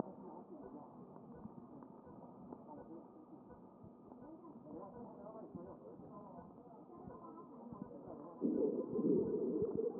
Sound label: CHEST